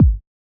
Hiphop kick; Reverb+EQ+Comp+Limit

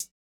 90s hiphop hihat; Reference beat bpm90-A

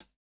hihat, house

